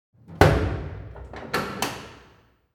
Jail door close prison distant perspective

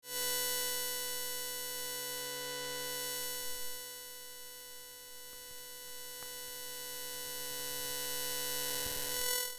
Robot vacuum electromagnetic textures

